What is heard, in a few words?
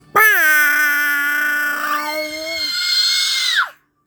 airhorn